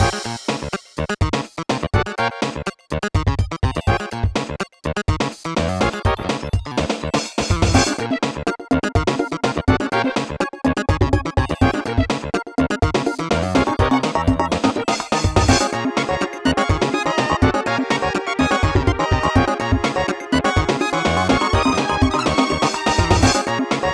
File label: jump button short loop; funky action game music